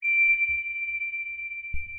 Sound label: sonar Ping Suit Far; Barotrauma; Real Sonar